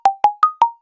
Elastic Ball, notifications; Infinix; XOS 12

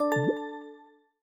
Power Connected; Honor; 600 Pro China; ui

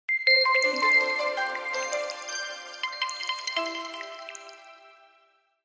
boot audio; Lenovo; A328

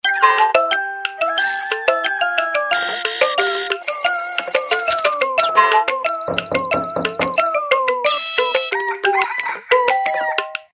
multi alarm, alarms; Sony Ericsson Xperia X10 mini

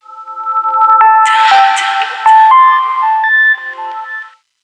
Power On

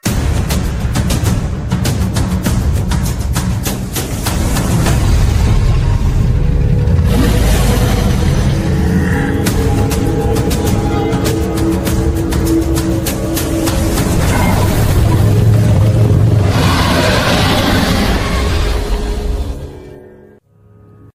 Decisive Rhythm, ringtones; Vivo; iQOO 15 Honor of Kings

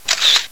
Shutter; Huawei; U1309 Emobile; hwcamera

